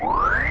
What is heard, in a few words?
Mirror Shield Absorb Loop; Zelda; OOT